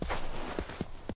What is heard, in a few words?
Snow Footstep; blastmorph pinball; xbox series xs